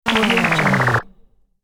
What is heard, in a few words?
8bit stage fail